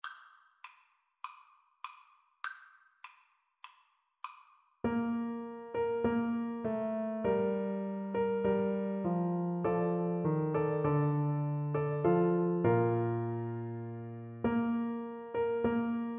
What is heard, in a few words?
steal away, Flute